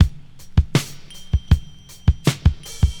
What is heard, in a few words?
80 bpm modern drum groove D# key, Free drum beat - kick tuned to the D# note. Loudest frequency: 820Hz